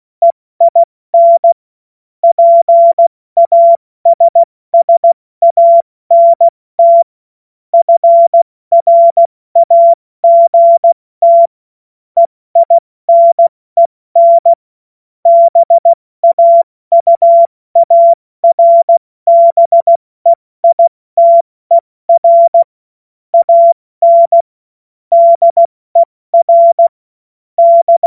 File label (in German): Witz, Morsekurs, cw morsen; Tempo 70 F80; hamradio; cw witze